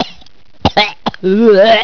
COFF PUKE

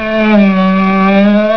BEAST CRY

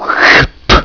SPIT

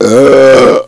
BELCH, VOICES

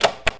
SWITCH

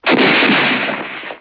RIFLE

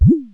BUBBLE, CARTOON

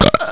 BELCH

